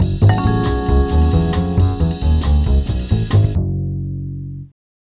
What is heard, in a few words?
TV Suspense Full F#, Lounge 135; Zero-G Kocktail Kollection; Construction Kitz (Lounge); TV Suspense Mixes 135